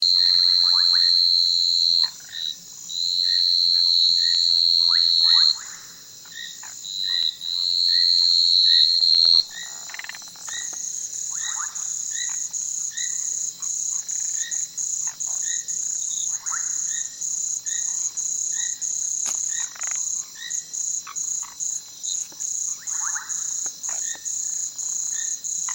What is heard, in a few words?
frog audio, treefrogs; the sex life of treefrogs